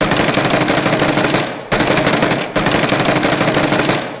machine gun; Crash Bang Boom

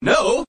"no" group of men SUNG; Voice

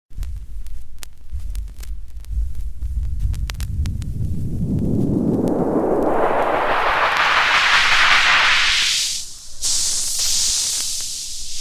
White Noise; motherlode

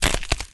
bone break

